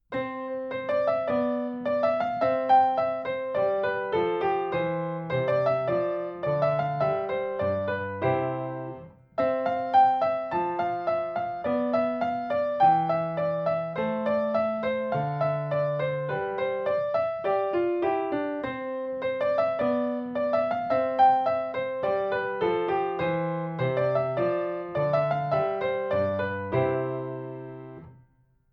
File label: Gavotte